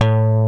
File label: LES PAUL